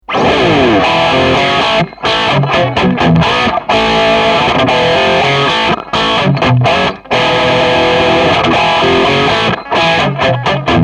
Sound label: Crunch Riff Neck